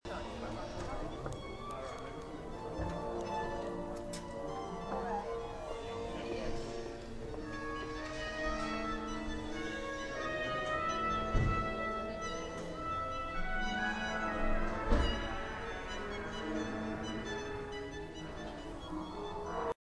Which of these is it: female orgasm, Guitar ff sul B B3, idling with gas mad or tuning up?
tuning up